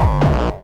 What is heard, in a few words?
BD Distortion, Bassdrums; Vengeance Samples